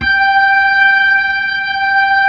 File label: B-3